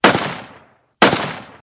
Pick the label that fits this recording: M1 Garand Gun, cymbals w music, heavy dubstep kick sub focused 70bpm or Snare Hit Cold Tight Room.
M1 Garand Gun